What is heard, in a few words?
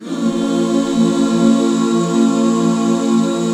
BB MIN; Best Service Gigapack 1